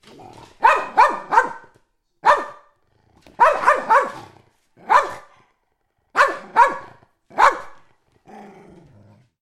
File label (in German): Hund klein